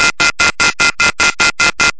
Off The Hook Sound

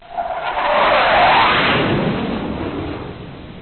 Jet Strike

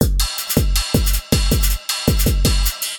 Drum Kick and Ride

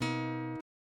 Guitar Lick

